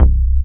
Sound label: BASS STER.